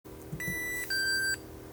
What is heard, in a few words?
format failed, tunes; PX4-Autopilot